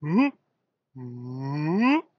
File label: pickup grunt; SlimeoosOdyssey; godot